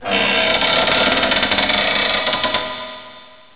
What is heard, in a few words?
metal